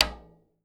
metal surdo

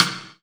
WET SIDE, Drum Mashines, SR-16 DRUMS; EdgeSounds

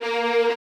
F# A2, Orchestra; AKAI Advance Orchestra Upgrade 97 Vol.1

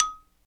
XYLO, Afro Xylophn; Roland LCDP11 Africa VOL-1; Bala & Xylo

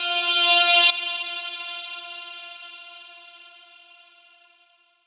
Sax, Chords Tones; Equipped Music; Loungin House

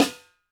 TD-20 Snare; Snares

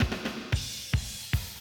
drum loop, 100 BPM

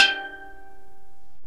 STEEL DRUM

